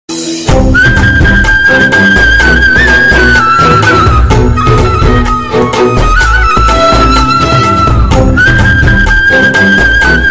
heroine entry bgm; kantara; leela; rishabshetty

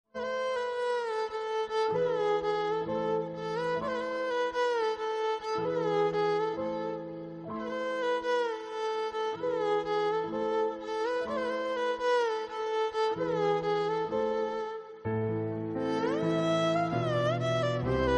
violin bgm

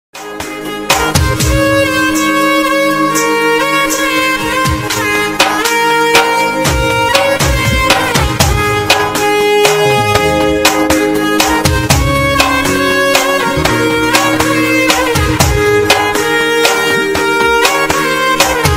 gujarati instrumental ringtone

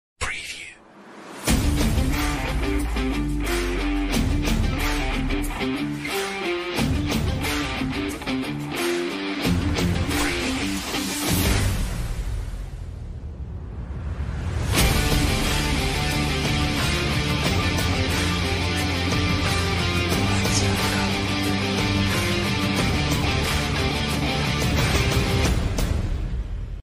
telugu bgm ringtones, south indian bgm ringtones

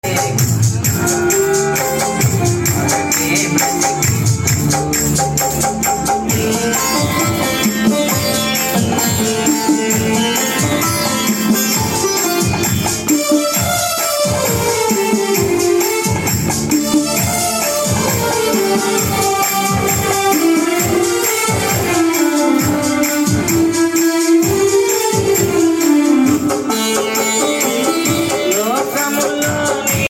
song bgm cover by keys

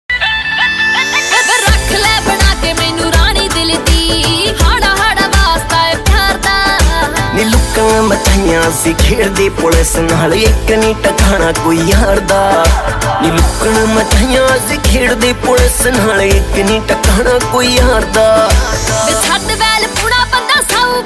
punjabi song music; raja rani

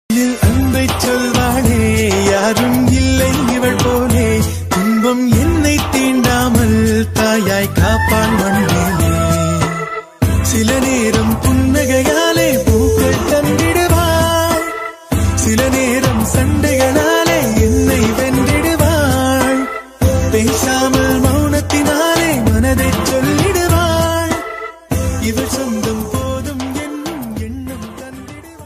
love ringtone tamil ringtone

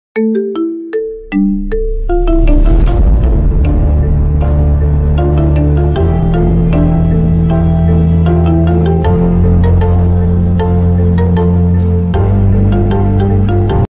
ringtone; solo leveling

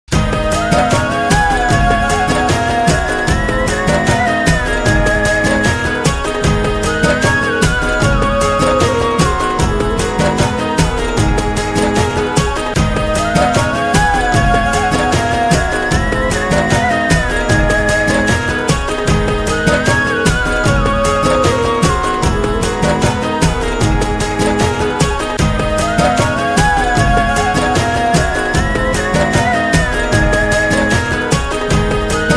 dada pota instrumental mobile ringtone

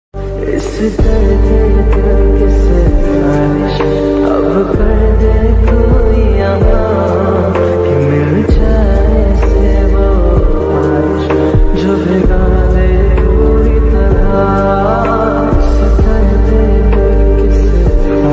lofi ringtone slowed x reverb bgm

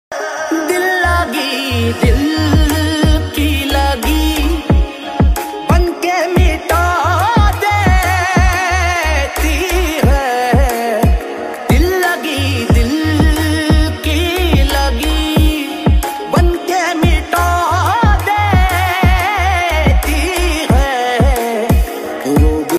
ringtone hindi sad ringtone song

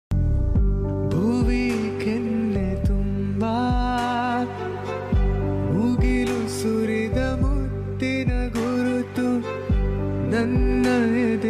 kannada song; jog falls

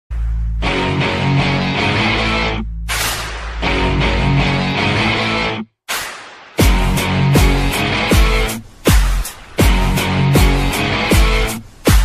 background music bgm; kabir singh; shahid kapoor

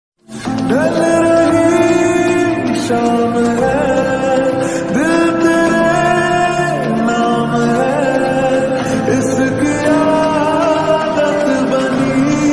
lofi song; kriti sanon

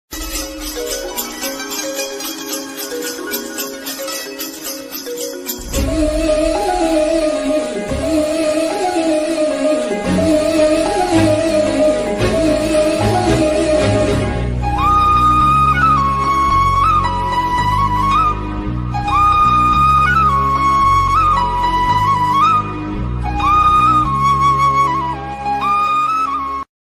love ringtone tamil; sandakozhi

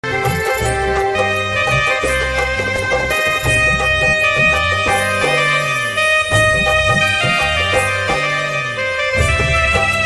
Gujarati ringtone

